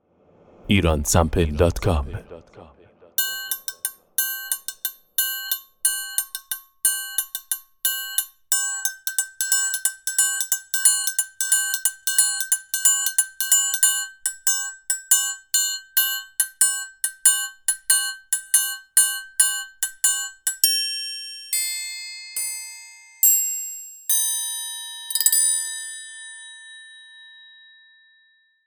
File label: triangle